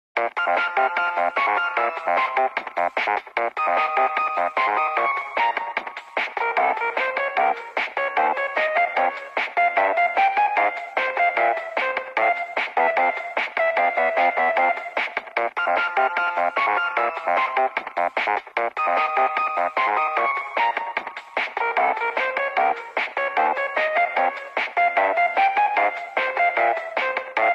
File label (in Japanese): ルイージマンション2 着信音; Luigis Mansion 2